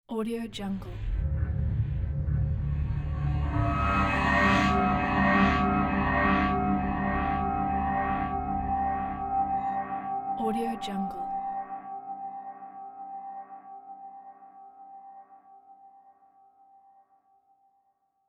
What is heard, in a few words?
cyberpunk logo